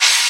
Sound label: short open hi hat sound c sharp key, Royality free open high-hat tuned to the C# note